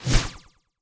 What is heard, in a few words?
Tech Whoosh